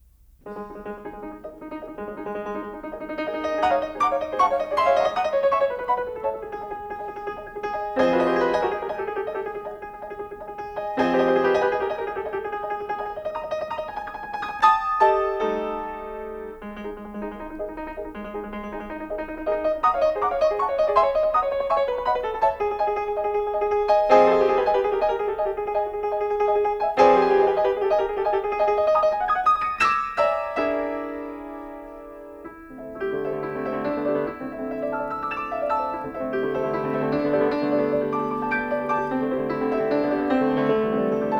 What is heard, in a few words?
suite for piano